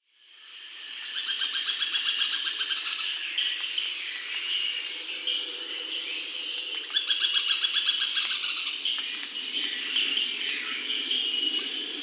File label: Rufous-tailed Foliage-Gleaner (Philydor ruficaudatus), Peru; S. America